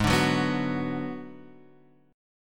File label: Ab Minor 7th Flat 5th, Guitar, Open F; strummed; JGuitar